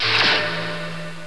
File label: enter; outerworld